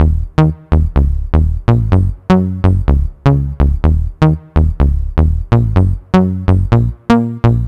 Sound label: BSE 125 D, BASS LOOPS, House; Best Service ProSamples vol.10 - House; AKAI